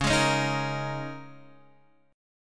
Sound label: Eb 7th Suspended 4th, Db